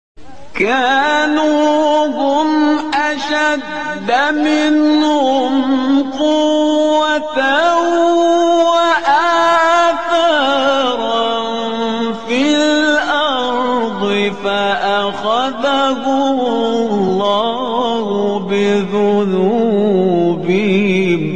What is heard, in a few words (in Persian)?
فرازی زیبا از تلاوت آیه سوره غافر; شهریار پرهیزگار